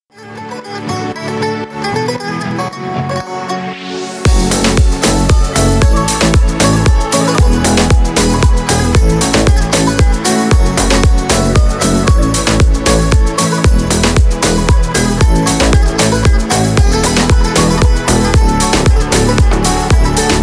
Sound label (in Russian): vostochnyj klubnyj instrumental